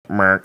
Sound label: funny sound